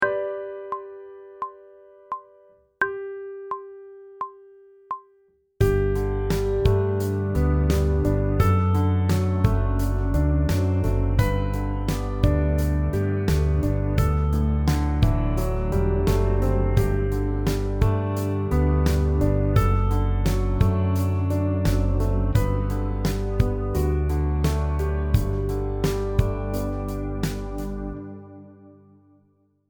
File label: Sight Singing backing track, pop vocals; Grade 2